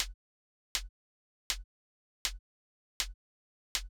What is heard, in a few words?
Hat Low; hydrogen